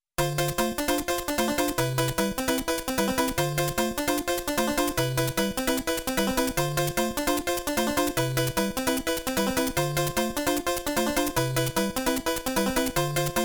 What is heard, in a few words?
Invincible BGM; Super Mario World